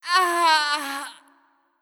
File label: Heroin Death